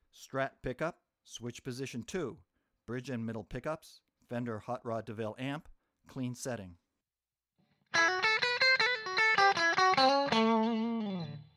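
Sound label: strat bridge middle hotrod clean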